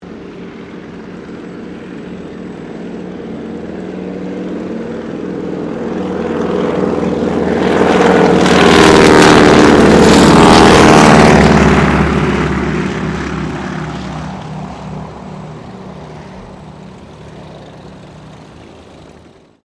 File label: AIRCRAFT CAC CA-25 WINJEEL PAIR TAKEOFF; RAAF Winjeel fighter trainer; Airplanes; Vehicles